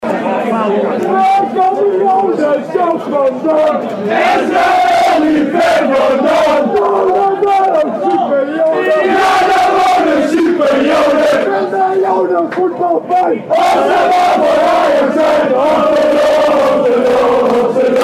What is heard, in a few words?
Call and Response